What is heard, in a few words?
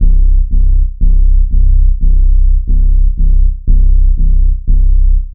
Phat Sub; бас; хип хоп